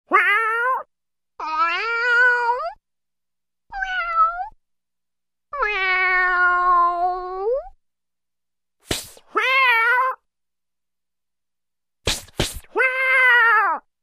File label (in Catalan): Gat